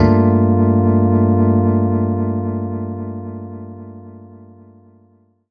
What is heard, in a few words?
Rhodes Chord; Zero-G Jungle Warfare 1, 2 & 3; 90 s Sample CDs